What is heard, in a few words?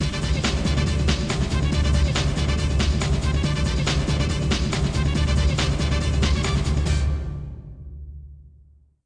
ditty; Nba2k